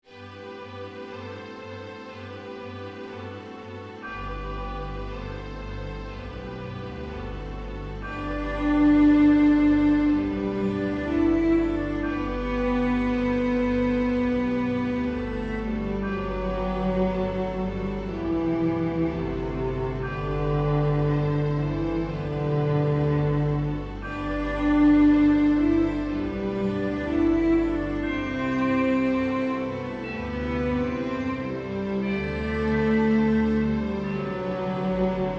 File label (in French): Rapsodie pour Violoncelle cordes et cloches